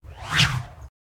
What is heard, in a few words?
Whoosh; NoizBoy; Hong Kong Action Kit - Hit Kit #2